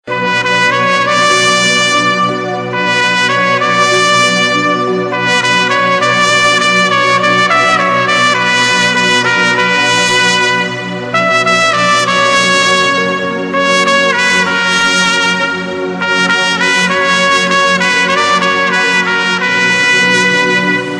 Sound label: Sad Trumpet